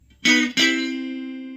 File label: Happy Guitar